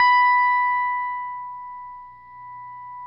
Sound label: STRATOCASTR